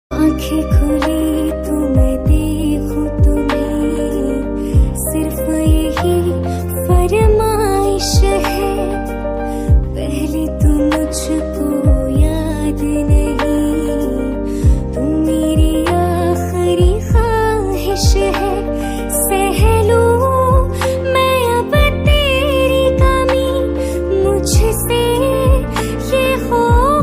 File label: Most Romantic Female Love Ringtone; ringtones